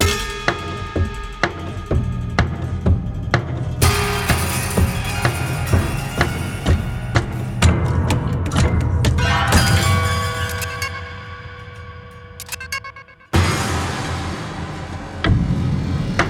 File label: vertigo acoustic; instant hitchcock for modern scoring